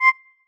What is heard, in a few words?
marimba; ゆめ2っき